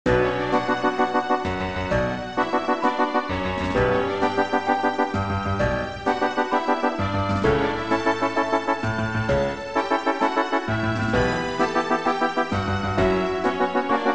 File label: Action; Might and Magic 2